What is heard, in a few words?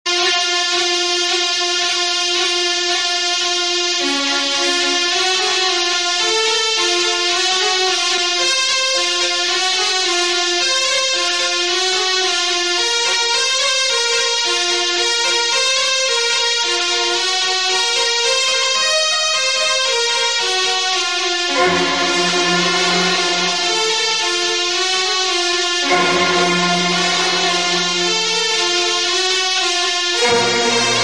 nagin music